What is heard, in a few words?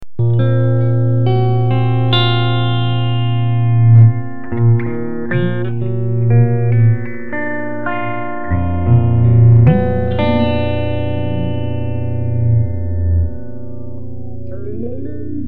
env pick Wash